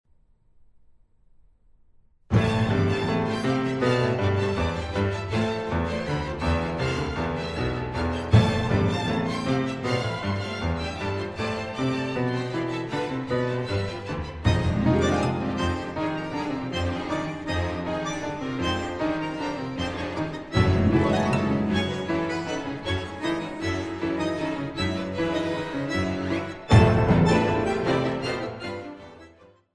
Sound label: Bandoneon Concerto Aconcagua Presto